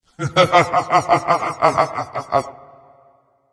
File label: laugh; Mech Geb; Smite